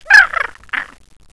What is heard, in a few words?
pain